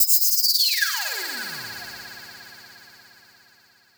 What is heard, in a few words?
FX Downlifter